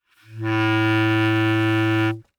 Bass Clarinet ff A2 stereo; Woodwinds

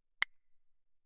castanet, ff; Percussion; hand percussion; castanets

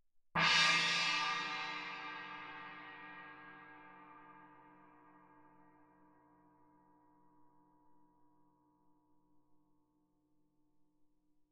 chinese mallet ff, suspended cymbals; Percussion

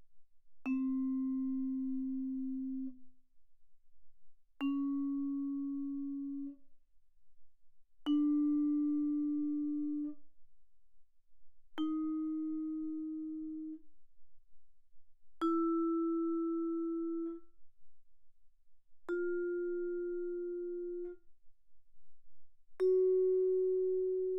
Vibraphone short sustain mf C4 B4; Percussion

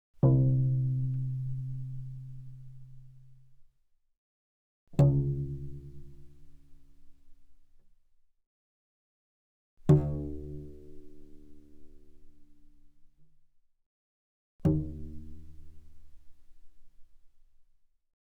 Cello pizz ff sul C C2 B2; mono; Strings

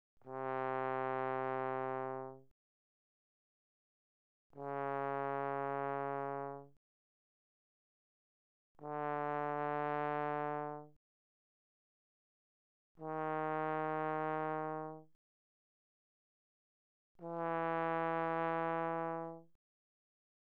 Tenor Trombone, mf, C3 B3; Brass

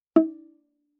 Viola pizz ff sul C E4; MIS Pitches - 2014; Strings

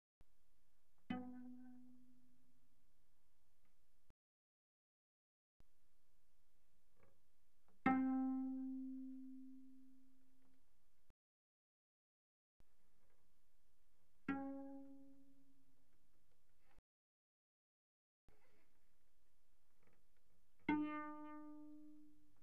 Cello pizz pp sul A B3 Bb4; Strings

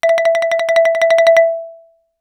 Xylophone hard rubber roll ff E5 stereo; MIS Pitches - 2014; Percussion

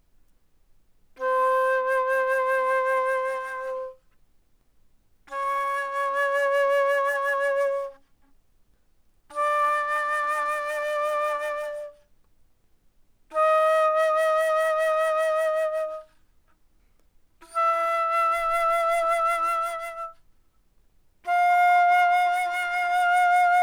Alto Flute ff C5 B5, woodwinds

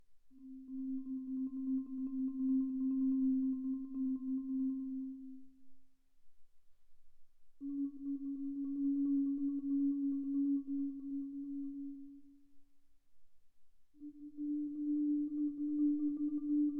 Marimba roll pp C4 B4; Percussion

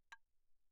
clave, hand percussion; claves

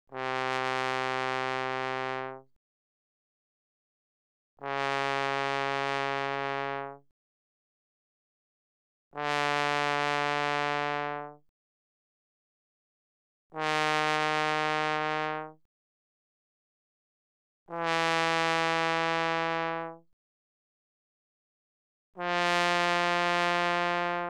Tenor Trombone ff C3 B3

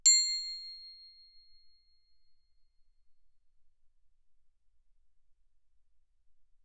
crotale C7 ff; Percussion; crotales

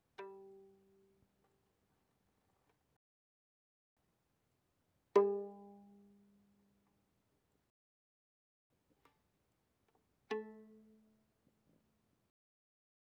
Violin pizz pp sul G G3 B3

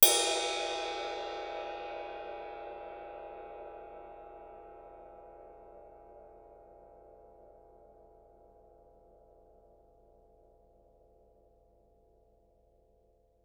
ride stick normal ff stereo, Suspended Cymbals; Percussion

